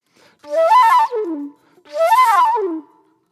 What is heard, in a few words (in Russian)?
Fx D, духовые инструменты